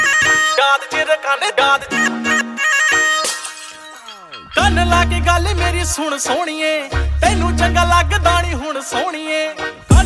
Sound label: music ringtone